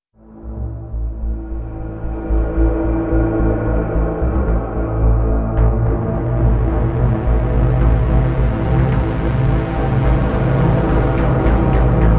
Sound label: Ambient